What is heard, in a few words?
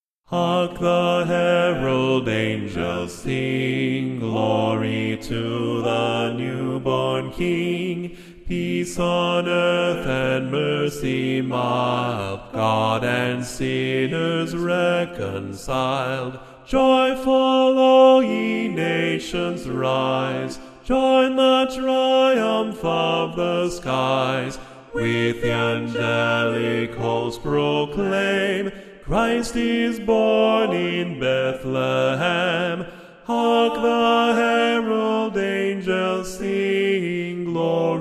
hark bass